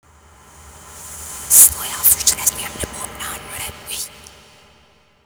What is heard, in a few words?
reversed; slender